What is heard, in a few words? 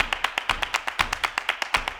120 CLAPS